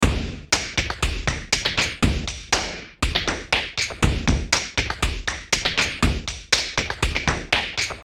Space Break

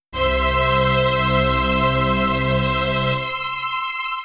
Strings C-F maj ending; Sunshine Meadow

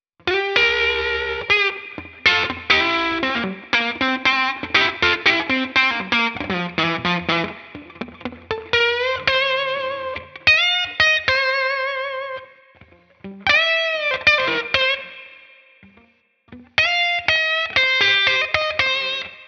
Tight Funky Foot; admiralbob77

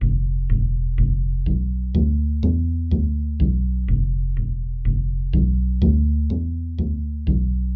bass

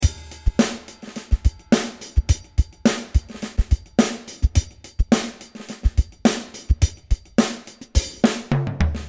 beat 106 bpm; Mixter Plus beat pack 2

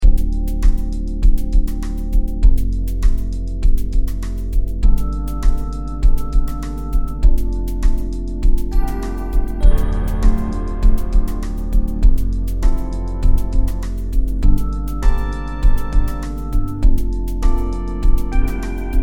Dm100 Groove

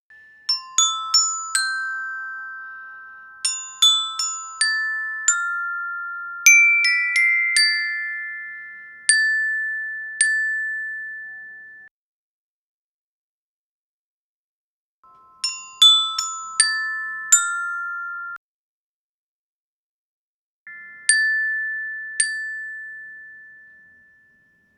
Uplifting Ending Glockenspiel

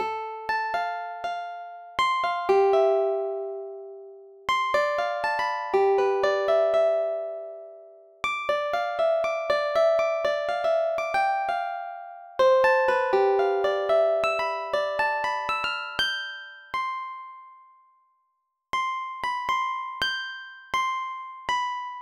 G120 Cimbalon

